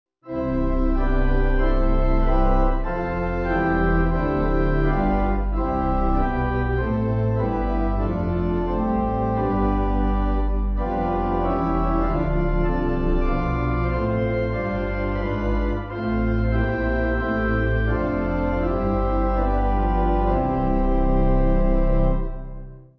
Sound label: Pipe